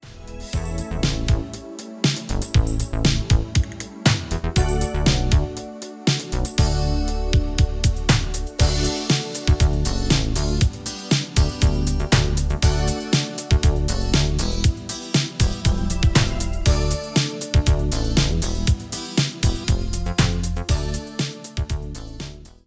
Yamaha Genos Style Jam

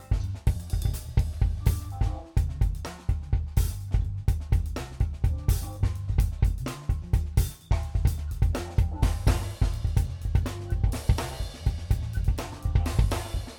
Roland SC OH + BD

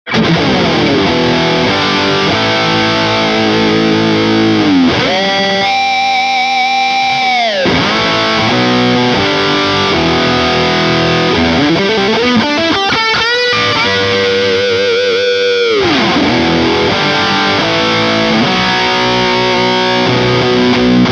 guitar; guitar hero

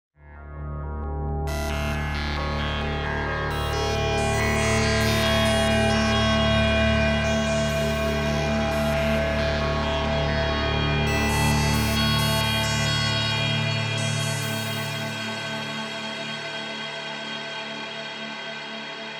evolving; TranzWave; Softube Console MK3

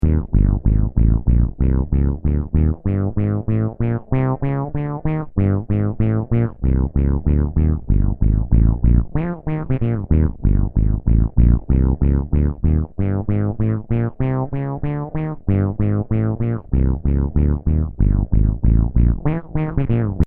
Synth

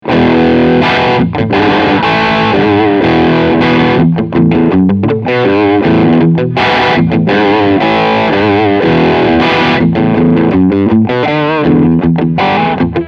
Crunch

